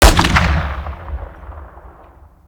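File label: weapon br2 fire player atmo ext; gmod; viper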